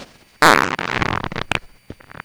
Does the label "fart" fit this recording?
Yes